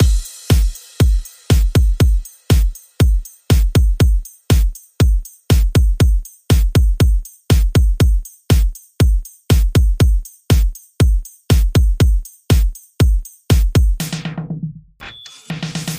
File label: Mixed Drums 120 C; Beach Day